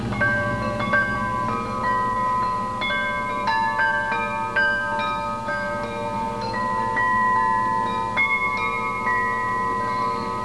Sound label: chime